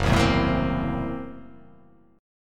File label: Gb 7th Sharp 9th Flat 5th Gb down; strummed